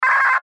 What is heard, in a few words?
Radio Morse Code; Weak Signal Letter H; Warfare; Blastwave